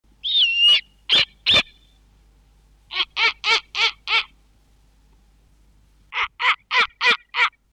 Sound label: Snowy Owl, Owl Calls; summer